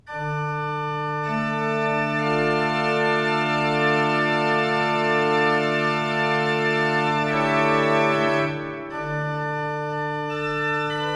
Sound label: toccata vel praeludium in d; weckmann